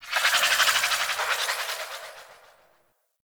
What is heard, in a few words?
Corpus Ship Data Rand, Ambience; Warframe; Corpus Ship Remaster